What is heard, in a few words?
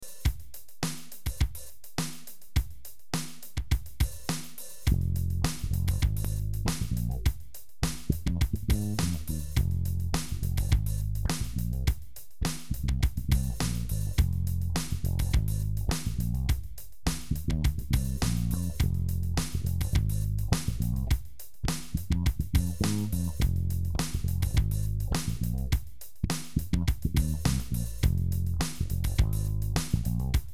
groove wah, bass duos